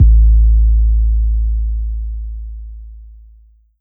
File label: Kick; pitchglitch samples